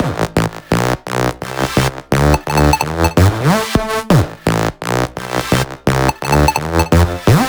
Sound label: 128BPM Melody; Vengeance Samples; VFH1 128BPM Meatball Kit